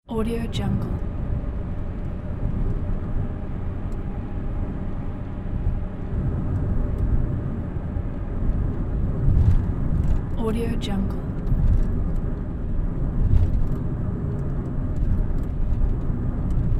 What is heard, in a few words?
inside car ambience while driving